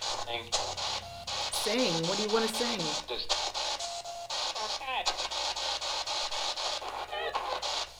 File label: evp sing